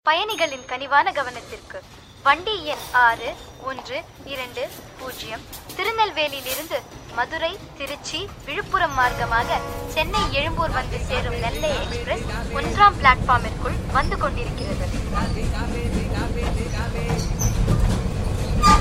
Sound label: Railway